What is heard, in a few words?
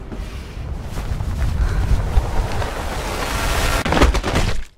falling; mirrors edge